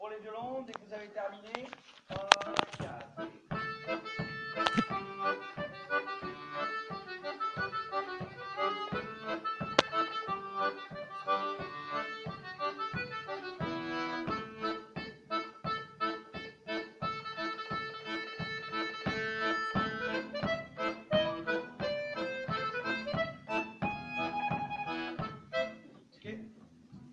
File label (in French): Transition violons